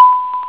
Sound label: radar beep